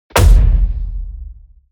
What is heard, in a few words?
Impacts processed; soundbits